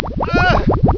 Acid Damage; Spectre Supreme Demo

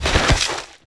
impact

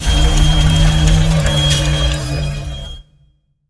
Just Metal

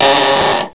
door mid; Wanted; doors

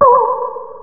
Hit Bumper; Realmz 4.2.2

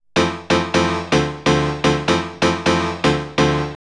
piano house 125 A; eJay; Keys

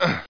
grab; heretic ii demo; player

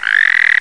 GOOD FROG; PUZMANIA

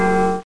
M GONG

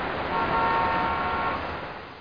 traffic; Custom 3D Home

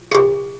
xylophone; Sound Effects for Multimedia - Volume 1

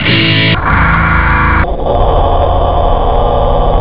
Power; guitar

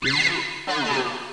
game over

